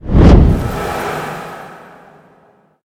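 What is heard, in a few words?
power activate; smite; thanatos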